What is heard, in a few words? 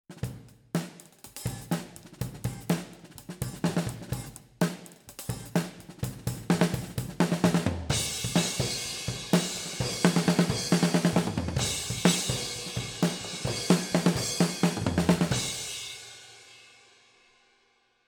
amb oh